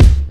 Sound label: bumpin boom bap hip hop bass drum single hit c sharp key